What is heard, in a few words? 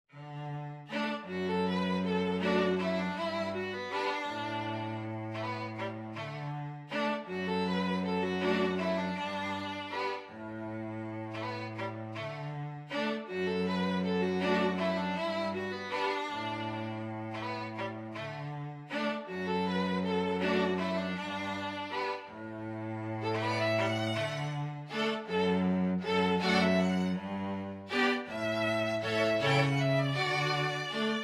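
QUARTET, Strings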